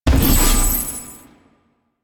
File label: Shared Fate Chain Stop C; Warframe; Warframes; Pax Duviricus